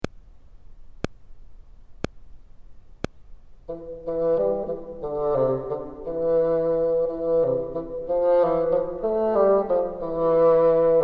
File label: bottom, bassoon